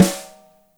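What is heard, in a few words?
DRY SN, drums; Spectrasonics; Burning Grooves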